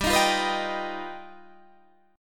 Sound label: G Augmented 7th